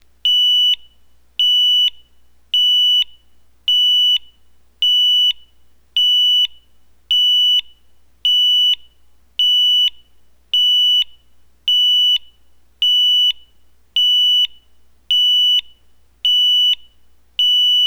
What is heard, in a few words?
BI BI SOUND, Piezo Siren